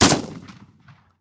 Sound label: water pipe fire; Rust legacy